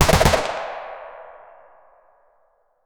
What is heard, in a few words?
sharp loud pis, firearm